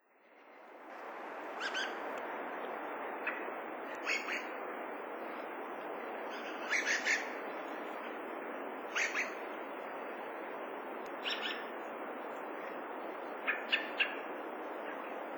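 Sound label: dusky thrush, nikko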